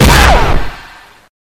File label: parry earrape; pizza tower; memes